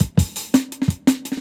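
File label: Jungle Breaks, 170 bpm; LoFri; Original Jungle Breaks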